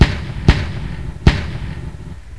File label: weapon